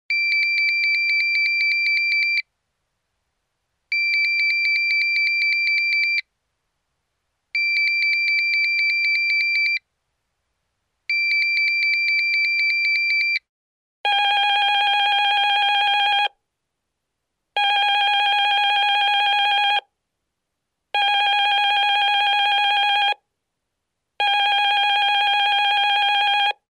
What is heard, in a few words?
Radiotelephone ringing